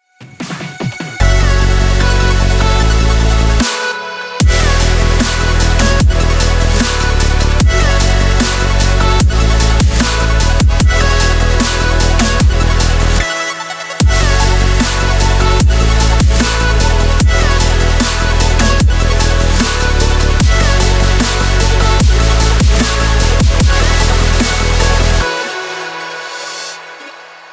powerful intense dubstep

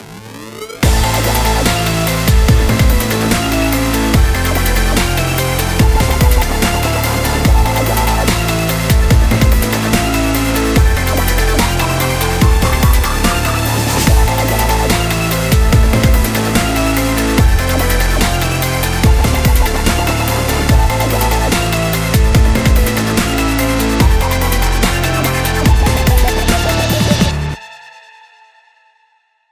action sports dubstep

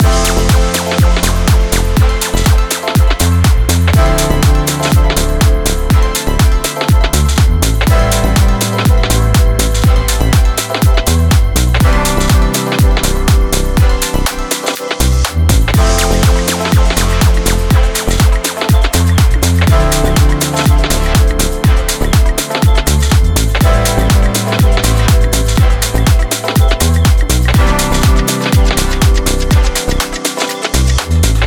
fashion lifestyle house loop

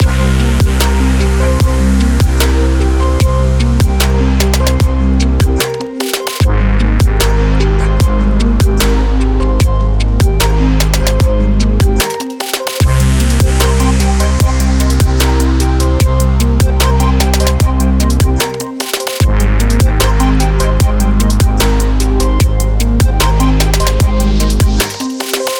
adrenaline rush dubstep

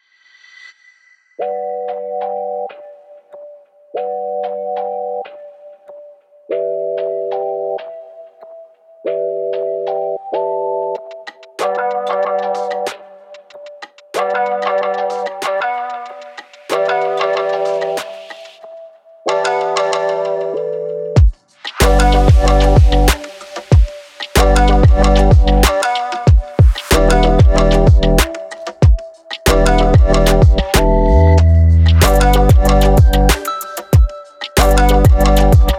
summer jam instrumental

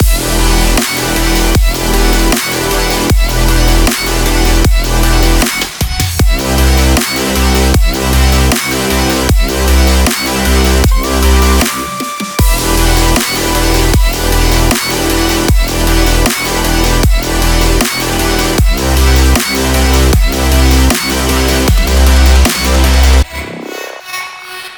epic emotional melodic dubstep loop

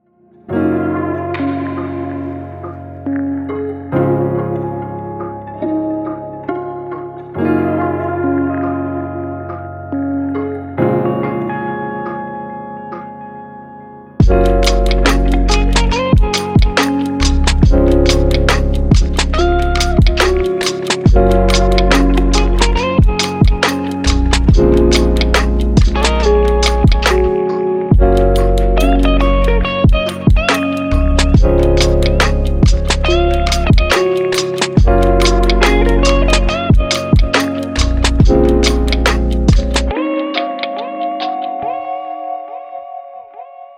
chill meditation

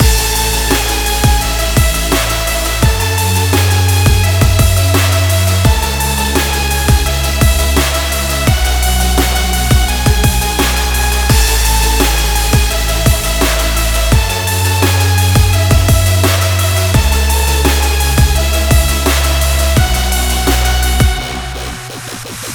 inspiring sport dubstep

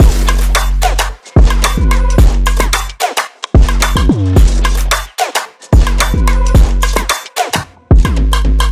dynamic street pulse loop

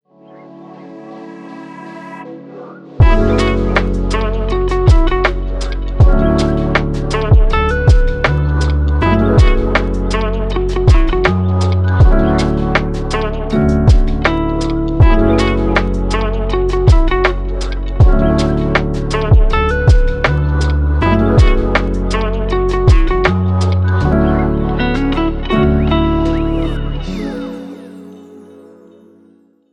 mellow lo fi

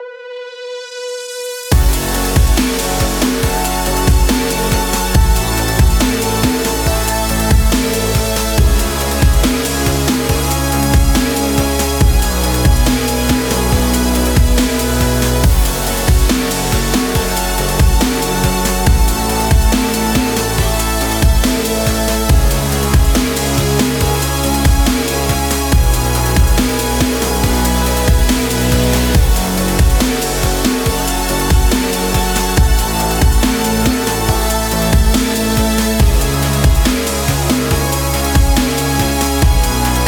extreme sports dubstep

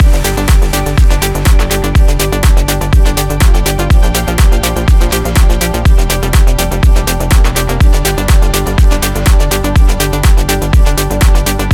science and innovation loop

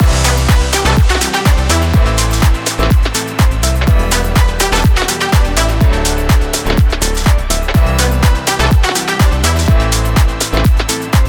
event party loop

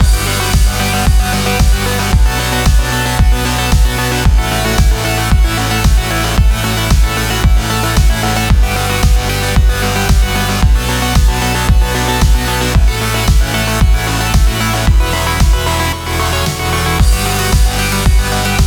hi tech and travel

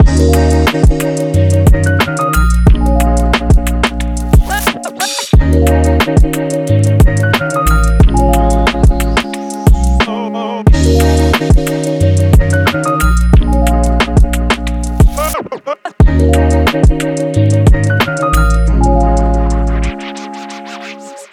travel vlog

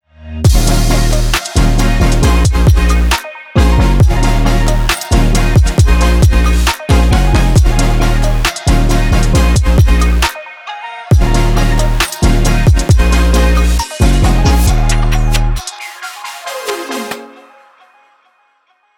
stylish future bass

